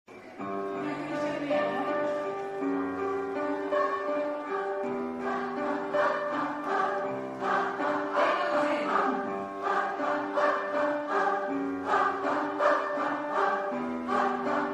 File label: tenor